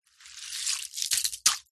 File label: nature ice slushy melted pick up drop splash